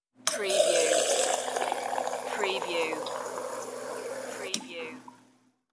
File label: Soda Gun